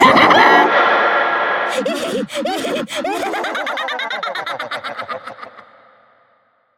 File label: jump scare voice laugh; Devour